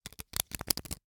Hwatu, cardgame, hardplastic, small, clatter, stack, drop, loose; Eiravaein Works; Vaeyan IV